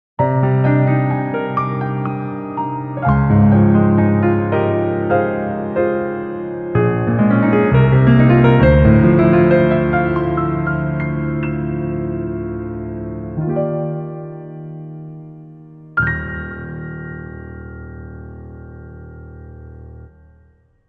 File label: Jingle; Bayonetta Origins